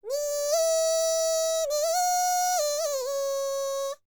BULGARIAN, World Voices, BALKAN; ILIO; Vocal Planet

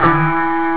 elec gt sustain; jazz jackrabbit 2

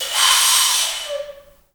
AF FLUTES, Heart of Africa; Spectrasonics; Heart of Africa 1

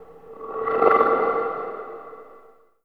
SKIMMER LO, PERC; NorthStar - The Wizard VOL-2; TSAR PERC

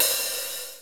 open hihat; DRUMULATOR; Crypton - DrumBase5000

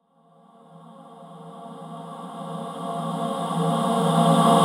Reverse Vocals A#min; Vengeance - Essential Clubsounds Vol.4; Effects

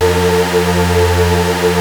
Prophet 5, F3; Hollywood Edge - Giorgio Moroder Rare Synthesizer Collection

